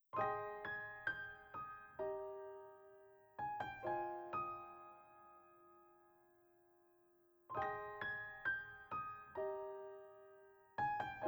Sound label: pause loop; Katou Megumi